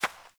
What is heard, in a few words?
Hare Step; Encounters; Animal Rescue